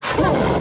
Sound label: pain; Devour